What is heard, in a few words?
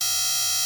buzzer; Dice World